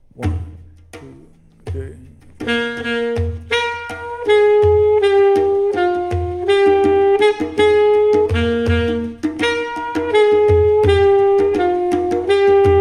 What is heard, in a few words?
sax solo; Longo masterclass; Soloing